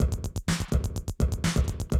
125, Classic Drum Loops

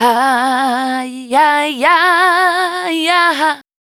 FEMALE, Dance Vocals

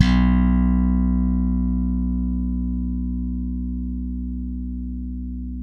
SLAP B, bass; Spectrasonics - Bass Legends CD 1; ALL THUMBS

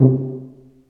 TUBA, Solo Brass, Tuba stacc

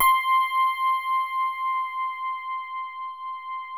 DX Rhodes; Best Service Advanced Media Trax Modern Composer

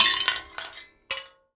Finger Gesture, Finger Prepared Kit; Battery 3 Sample Kits

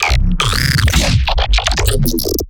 glitch stab; Virtual Riot; Glitch Stabs

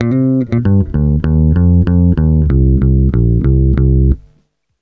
BASS, BASS GTR

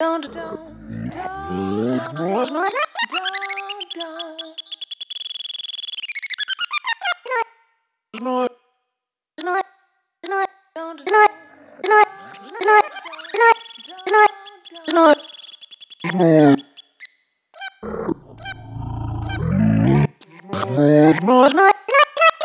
Spin Cycle; Sonic Foundry (Sony Creative Software); Pocket Diva World Class Vocal Samples

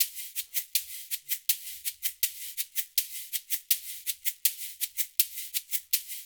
LOOP, SHAKERS; Percussion; InVision Interactive; Percussion Slam

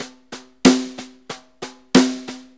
Brushes 8ths Snare; Sonic Foundry (Sony Creative Software); Drum Tools; Snare Loops

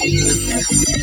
metallic weird bass

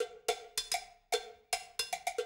110, Classic Drum Loops

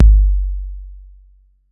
BD 808

